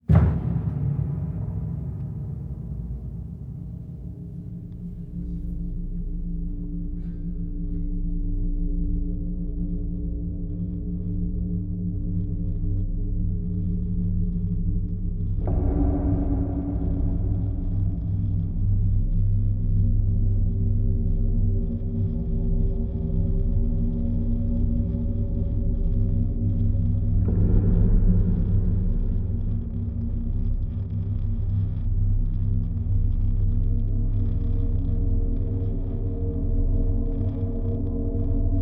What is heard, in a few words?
piano in duress; weeklybeats 2026